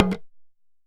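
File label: small tama hit; Best Service; World Colours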